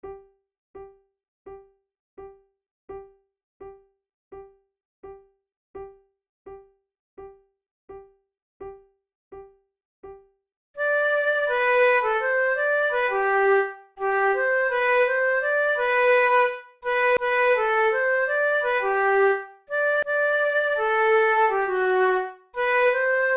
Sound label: duet, flute